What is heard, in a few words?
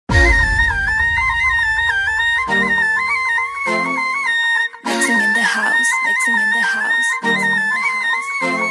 South Indian Instrumental